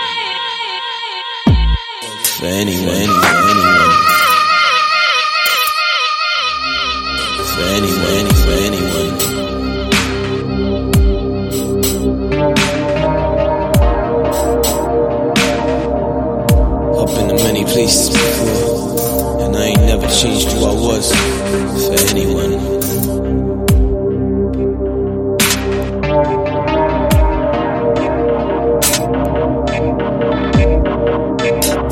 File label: dubstep; LOFI